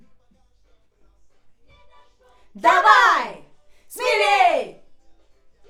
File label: Schoeps AKG, piano